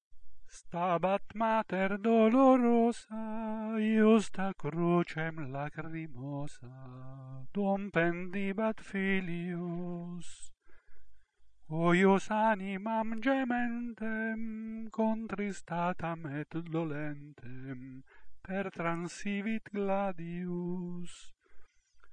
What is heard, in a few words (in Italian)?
Stabat Mater - gregoriano